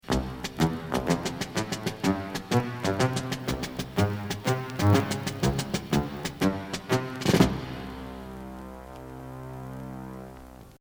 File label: Low Synth Transition